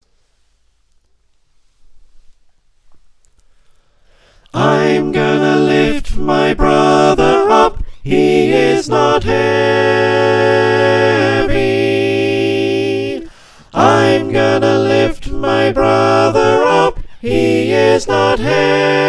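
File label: soprano alto tenor bass, choir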